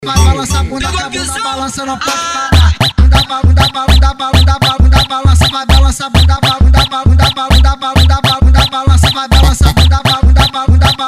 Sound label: funk